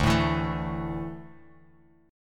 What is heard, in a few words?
D Suspended 2nd D down Bass Standard; strummed